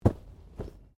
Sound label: Bodymovement Fall Light